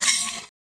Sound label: sfx, futuristic weapons sound effects